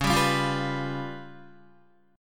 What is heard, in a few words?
C sharp 7th Flat 5th, Guitar; C#7b5; strummed; JGuitar